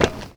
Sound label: step metal, footsteps